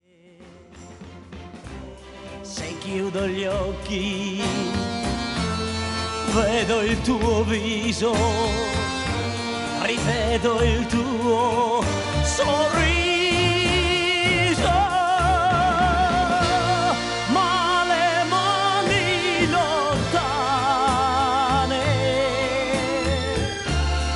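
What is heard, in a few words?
FISARMONICA, accordion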